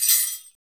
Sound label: BEL, Orchestral Kit; Roland LCDP03 Orchestral Perc; Orch Combo 1